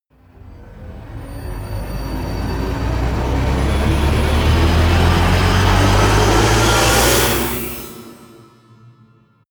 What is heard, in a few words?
silverwind, risers